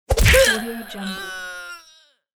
body punch teen groan

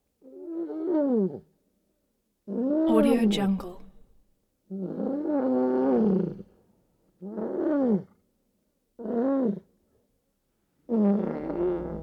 creature moan sad pain complain squawk

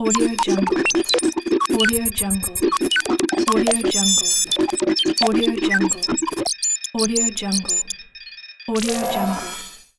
hitech cybernetic sounds

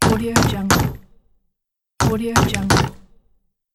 planing wood

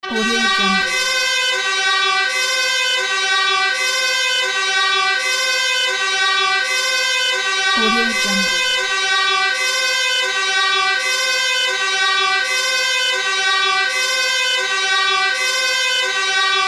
fire siren

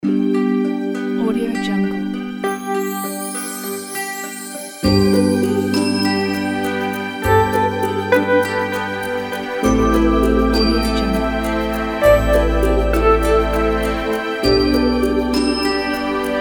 christmas advertising background

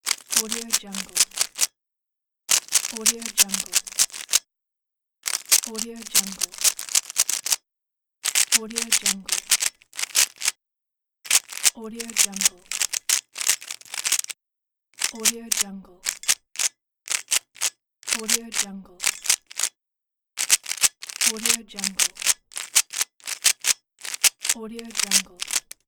rubiks cube

